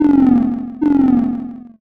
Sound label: crow